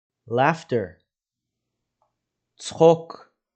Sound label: laughter – צחוק